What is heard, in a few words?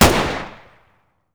m9 fire, weapons pistol beretta92fs; garrysmod